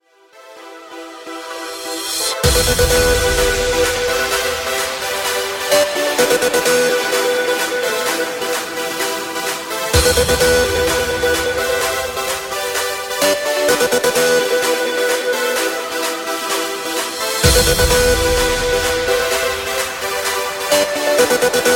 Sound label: Big Room 128